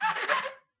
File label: clean